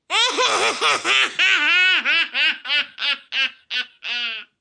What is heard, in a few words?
Laugh Maniacal, Laugh, 1 boy,10 yrs, evil cackle, human voice, child, kid, male; Laughter; VoiceBox